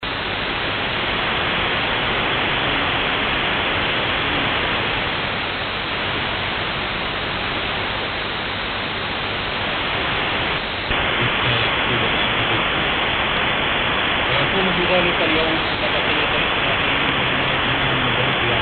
17845khz AM Family Radio USA; WYFR

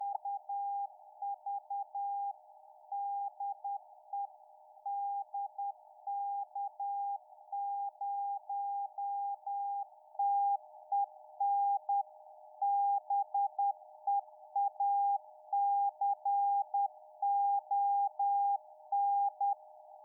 28257khz; DK0TEN; X-One-AD370

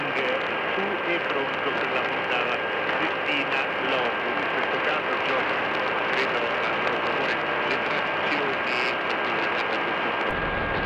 Radio Milano 900khz AM; RAI

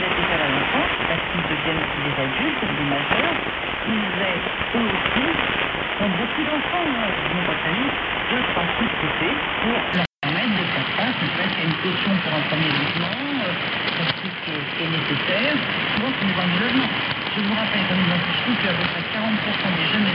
864 kHz AM France Bleu HF1000 R9500

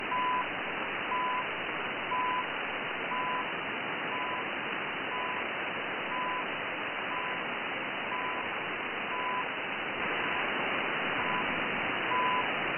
14670khz Time Signal; CHU; X-One-AD370

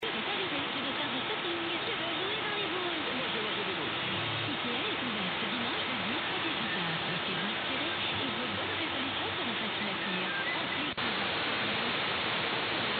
Radio 621khz; RTBF

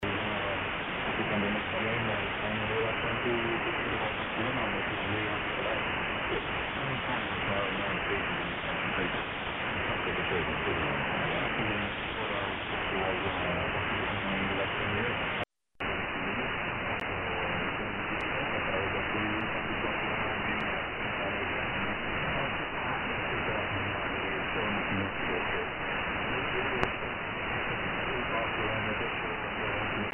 21470khz AM 6khz; BBC

